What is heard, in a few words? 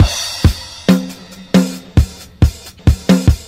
splash, Boombap Drum loops